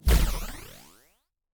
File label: Recoil Blast, Sci-Fi Blaster; Async Audio